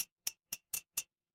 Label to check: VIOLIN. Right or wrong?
Wrong